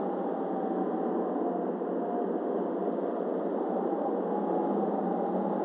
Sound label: office outside wind; ambient